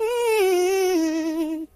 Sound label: "Hmm", voices; Singer; hedgewars